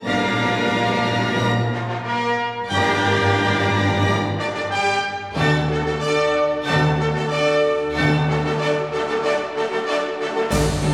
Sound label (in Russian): Фанфары; Вагнер; Риенци